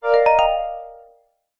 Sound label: multimedia alert positive bright; zapsplat